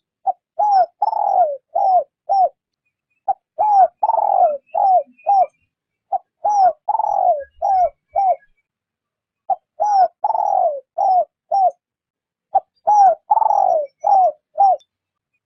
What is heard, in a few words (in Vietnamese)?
Tieng Cu mai gay kich trong